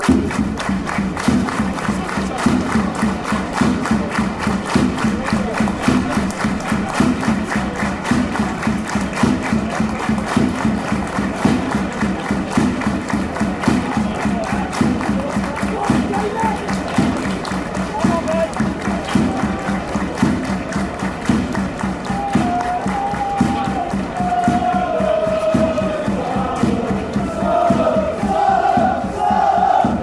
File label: drums, fanchants; shrewsbury